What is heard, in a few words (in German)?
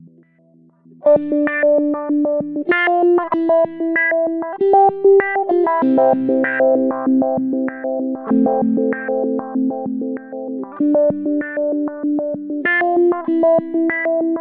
Sequenzer